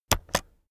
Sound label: keyboard external space press slow heavy